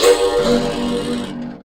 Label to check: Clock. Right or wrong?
Right